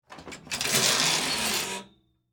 Hangers Movement, Household, Hangers, Movement, Slide, Hotel, Closet